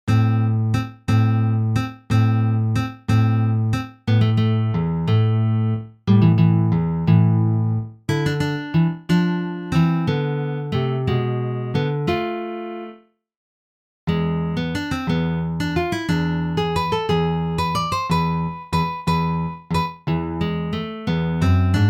valse; oilem goilem

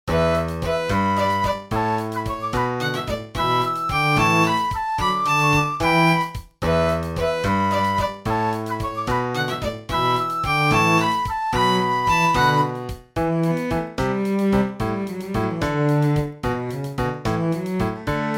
batterie

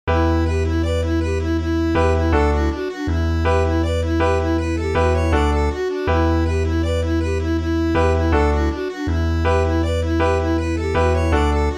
violons; drawsy maggy

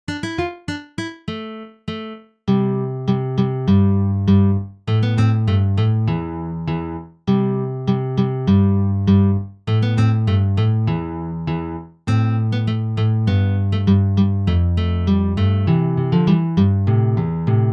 guitare; cassandre

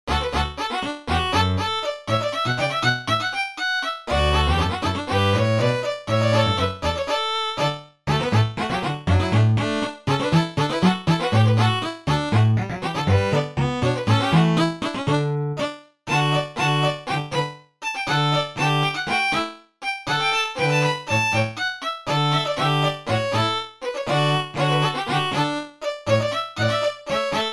quatuor a cordes; madam maxwell

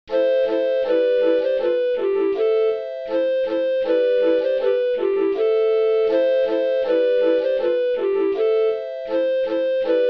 bonsoir maitre de maison flutes

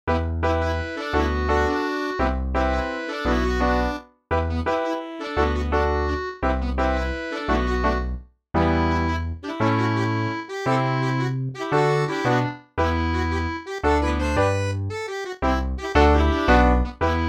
rondeau descendant

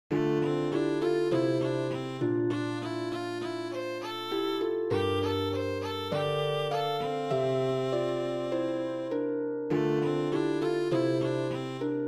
kitchaven harpe

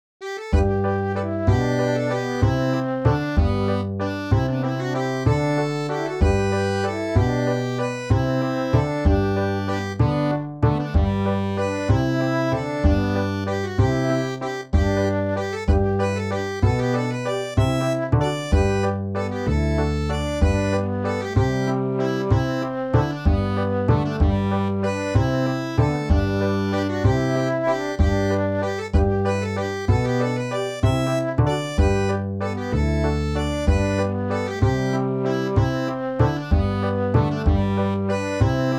valse

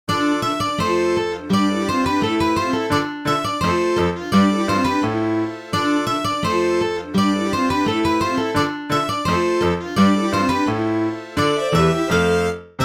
andro traditionnel

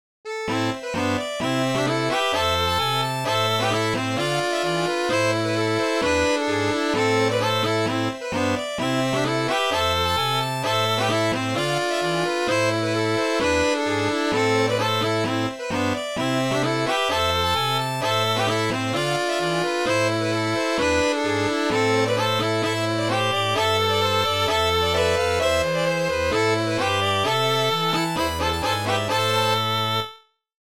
bourree; aurore sand

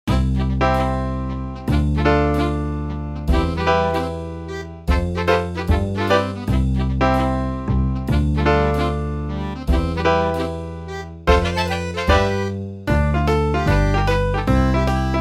folkabilly batterie simple

